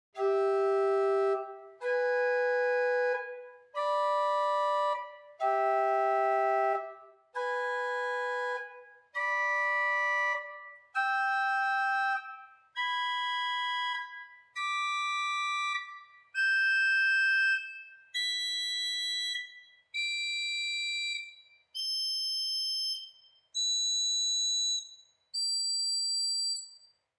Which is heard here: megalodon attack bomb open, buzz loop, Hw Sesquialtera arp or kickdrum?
Hw Sesquialtera arp